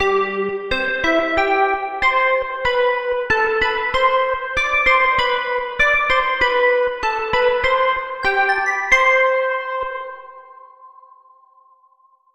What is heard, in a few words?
chime